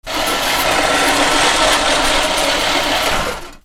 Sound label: GARAGE